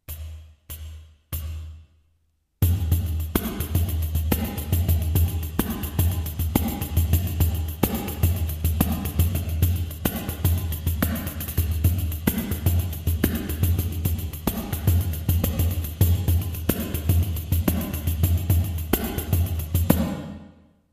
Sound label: Groove, pandeiro